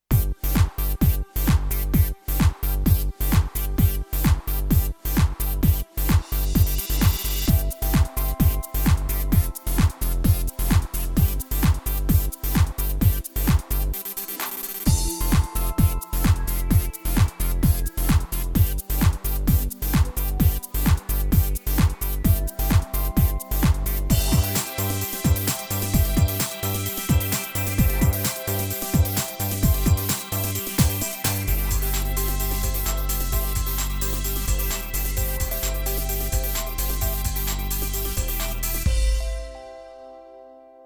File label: Roland BK3 Styles